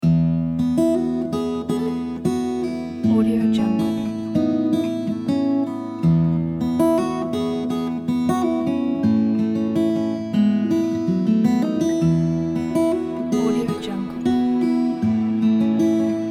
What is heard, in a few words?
sentimental acoustic ballad